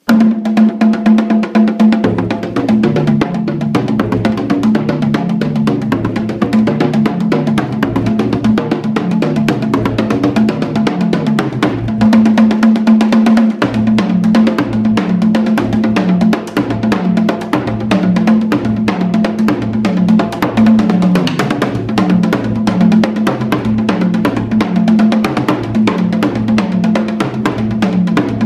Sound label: uchiwa daiko